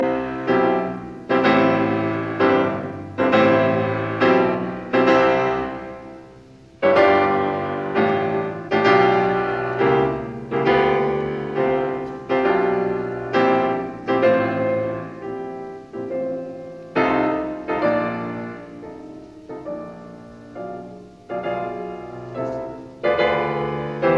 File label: Variations in C major on a Waltz; 33 Variations; Op 120